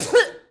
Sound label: Boxing Female Spit Mouthguard